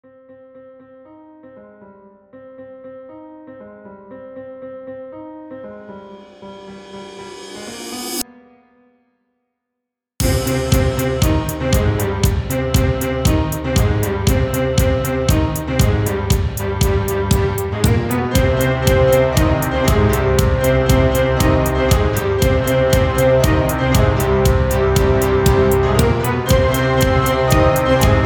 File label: Intro Music